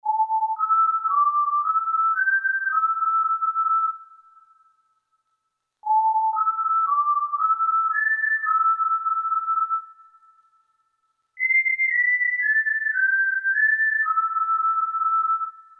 led, E-mu Proteus FX; Wissler

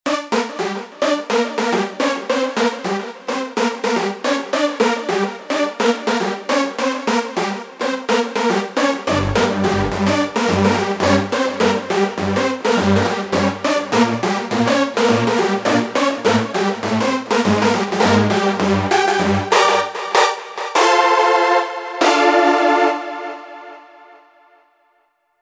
Tek-Brass, Roland V-Synth; Factory Patches

